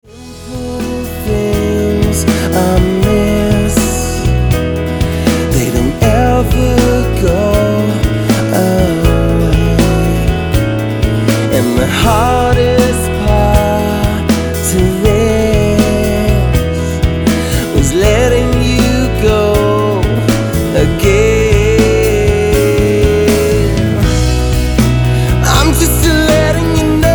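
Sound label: vocals mixed mastered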